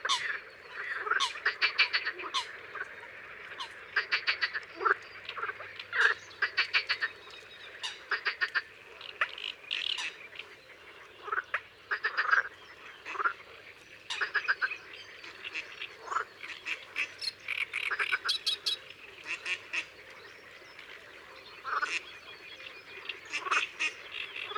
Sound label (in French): Foulque macroule; Isere; Concerts naturels de l'Isere; Studio Les Trois Becs